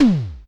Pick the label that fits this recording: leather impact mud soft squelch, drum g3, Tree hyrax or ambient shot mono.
drum g3